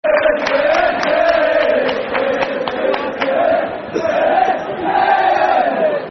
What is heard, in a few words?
P N E Football Chant (Soccer Songs); Preston North End Pne